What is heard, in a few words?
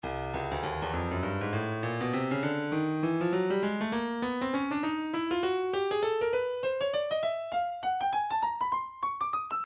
breakbeat midi 100 bpm; Stylus